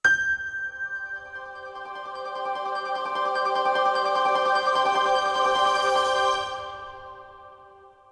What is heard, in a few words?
Quest completed